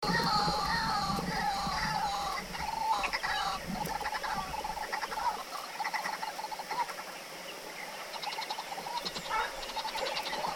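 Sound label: white-breasted waterhen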